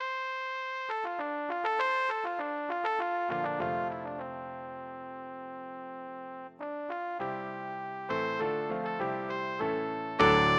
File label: debussy flaxen hair, trumpet